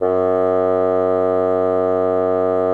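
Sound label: bassoon mezzo F#2; Xsample VOL-6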